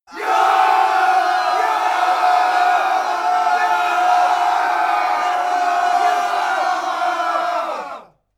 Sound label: battle cry